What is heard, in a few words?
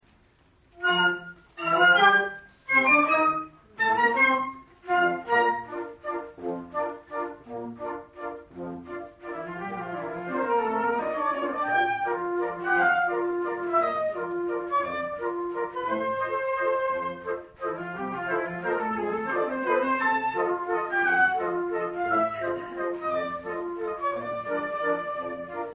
new year consert, flute